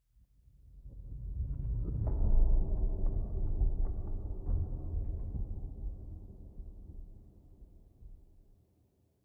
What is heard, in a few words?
Room placed low